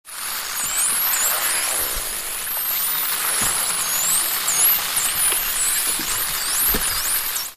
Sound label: bottlenose